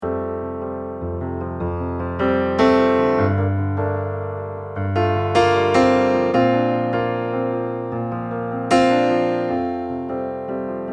Pianist; London

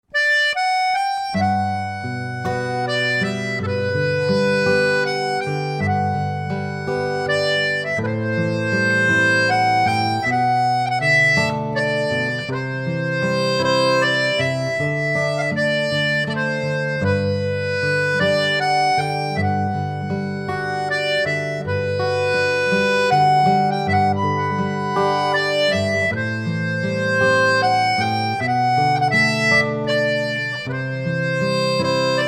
Theatre Accordionist; Musical Theatre Sample; London Accordionist

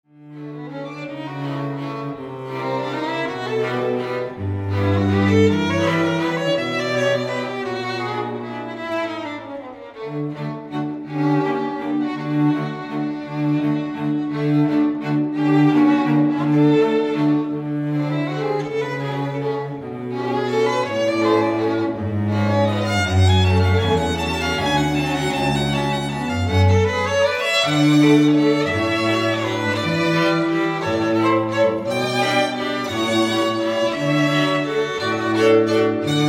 String Quartet; London

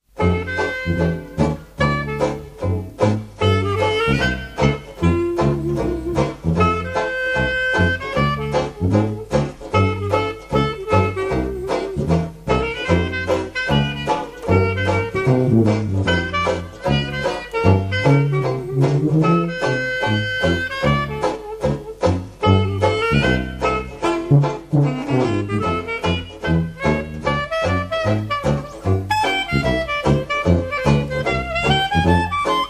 jazzband

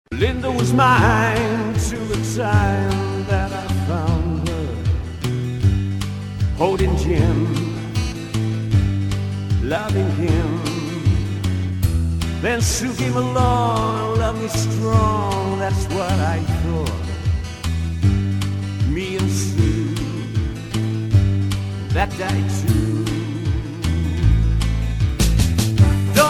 tribute singer; worcestershire

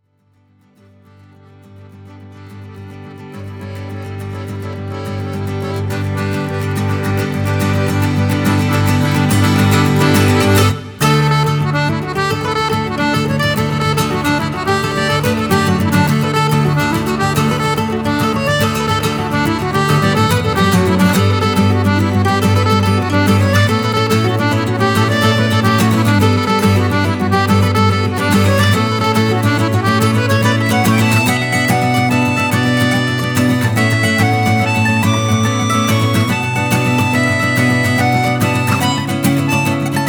Traditional Folk Band; London

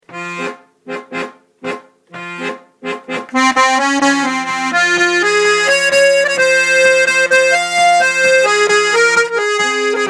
South American Accordionist; London Accordionist

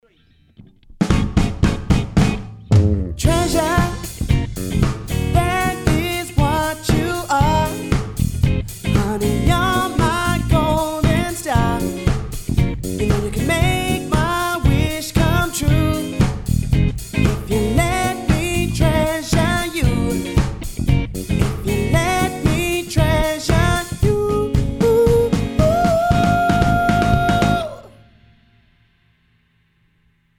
Motown Live

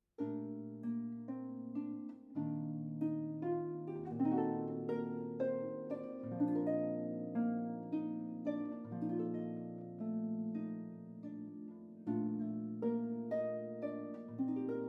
Harpist; London

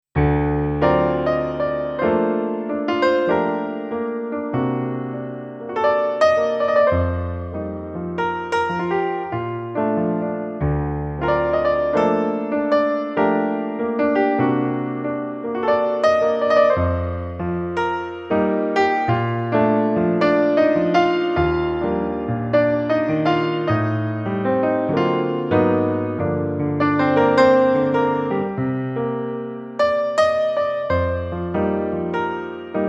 Pianist; Essex

